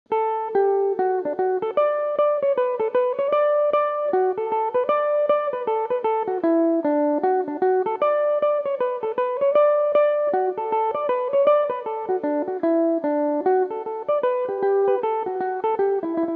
kitty got a clinking coming from the races fling D major, learn some tunes